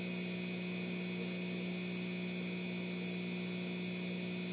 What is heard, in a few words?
light hum